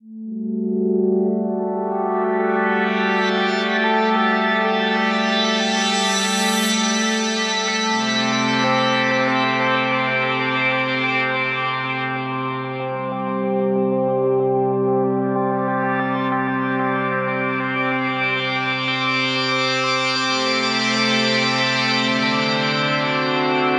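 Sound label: Evocative Pad G Major 125 bpm; MIDI Clips; Tonal; Atmospheric; Ableton; Factory Packs; Mood Reel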